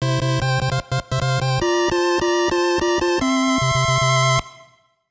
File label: Match Win